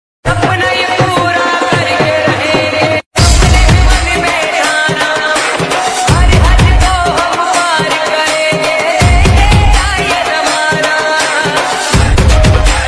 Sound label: dj Remix song